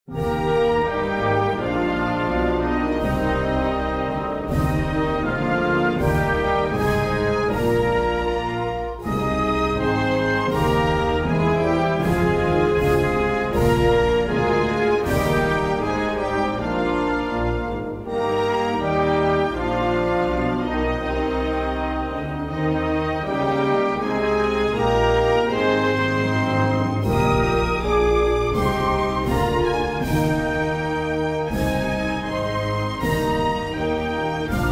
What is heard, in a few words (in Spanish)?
Himno Nacional de Brunéi